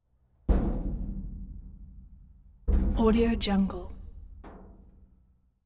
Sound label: dumpster bangs; prosoundfx